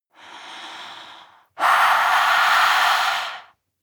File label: breath female eerie hiss